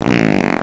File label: fart; Dynamite